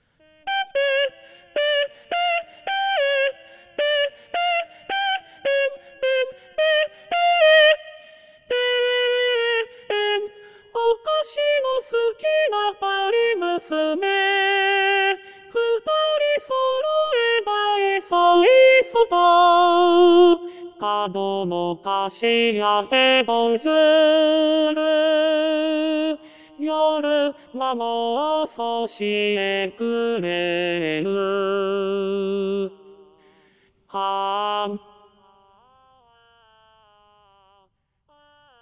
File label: Tenor Ⅰ